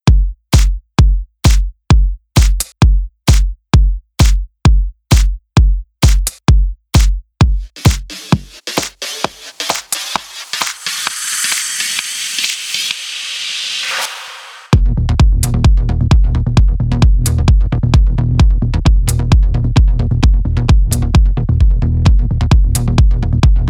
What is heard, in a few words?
techno beat, 432 hz